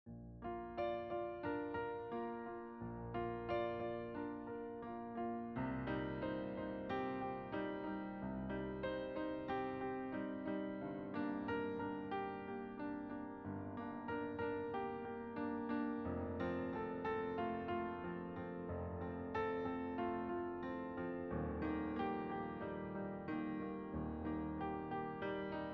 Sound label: Walking Meditation with Music